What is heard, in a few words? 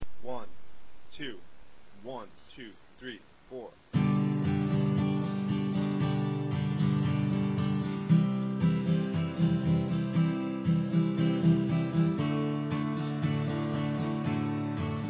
strum, guitar acoustic